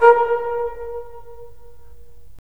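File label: brass; Roland - LA Composer vol. 3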